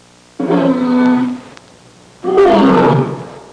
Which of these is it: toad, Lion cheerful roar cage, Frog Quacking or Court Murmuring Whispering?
Lion cheerful roar cage